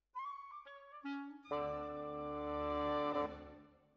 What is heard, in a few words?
Bassoon, Jazz for piano